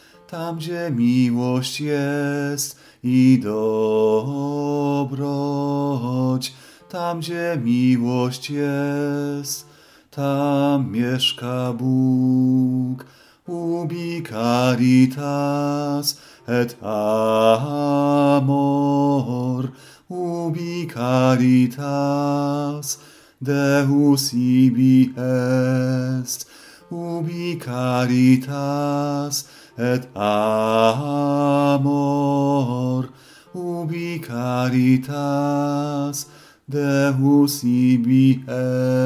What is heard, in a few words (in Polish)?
Tam gdzie milosc jest, Taize; ubi caritas